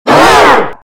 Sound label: scream male in G major